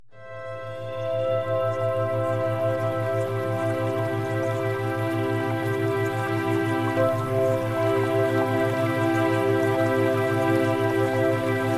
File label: Music Theta Waves; Premium Subliminals